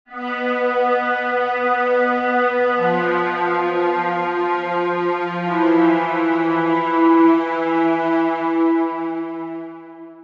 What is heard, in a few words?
Pad; Routed Sound; Spire Expansion - Chaos Bringer Vol.1